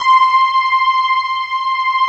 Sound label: JAZZY C5